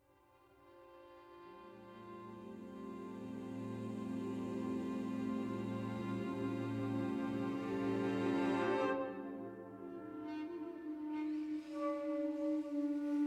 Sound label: Cinematic Sequence